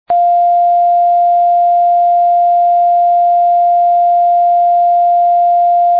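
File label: F natural 2, recorders; tuning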